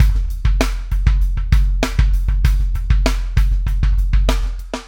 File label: SHRED, Backbeat